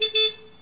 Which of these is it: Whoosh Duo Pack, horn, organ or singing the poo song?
horn